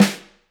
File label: K2 room Snr, drum